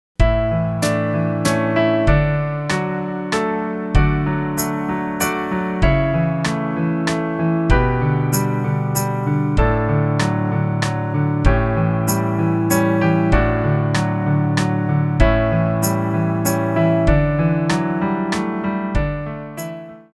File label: French Folk Song Harmony